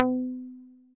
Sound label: Fuzzy Pan Steel, Synth Keys; Instruments; Ableton; Factory Packs; Synth Essentials; Collision